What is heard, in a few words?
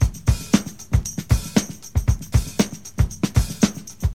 116 bpm drum loop sample c key